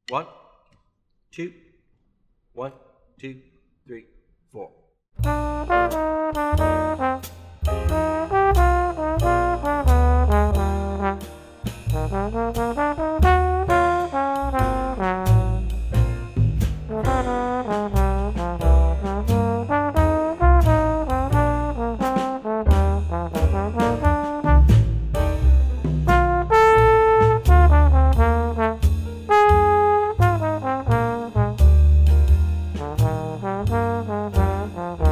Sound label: swing tenor trombone